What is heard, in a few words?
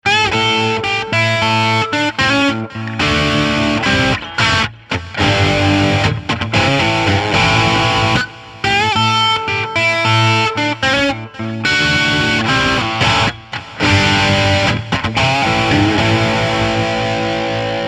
Crunch